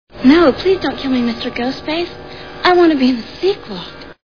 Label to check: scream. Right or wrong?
Right